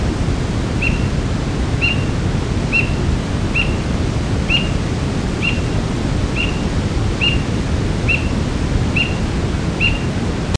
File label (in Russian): bolsheklyuvij ili dorozhnij kanyuk buteo magnirostris